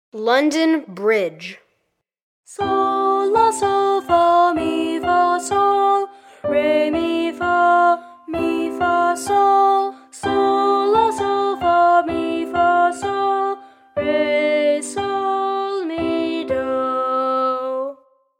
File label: London Bridge singing